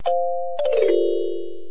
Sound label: miss